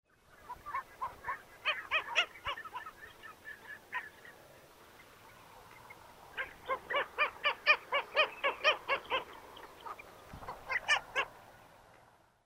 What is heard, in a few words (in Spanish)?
pato zambullidor chico, Oxyura vittata, Lake Duck; cantos